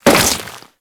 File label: Step Flesh, Footsteps; Enemies; Crewman; Warframe; Corpus